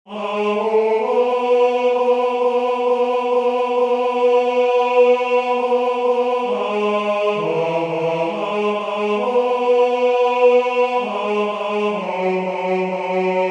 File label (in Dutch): Tenor, koor; Notre Pere